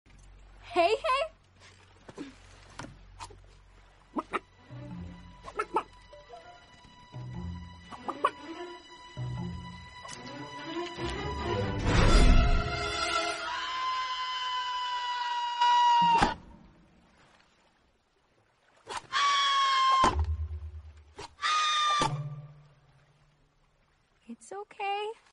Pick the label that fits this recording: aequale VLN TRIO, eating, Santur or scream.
scream